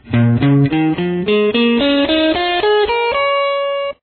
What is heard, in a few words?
g Scale pattern example, guitar